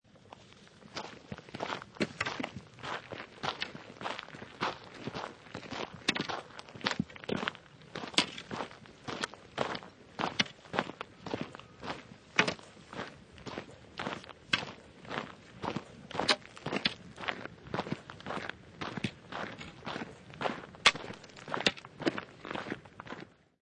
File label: Footsteps Two Persons Walking On Gravel; Two persons, one holding a walking stick, walking on country r; Lynne Music